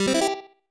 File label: Slot Start; Hisshou 777 Fighter II; SNES